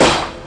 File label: REAL DRUM SD